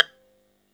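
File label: EL BZ PIZZ G, bouzouki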